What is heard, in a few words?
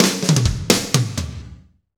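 slow shuffle, Roland drum beats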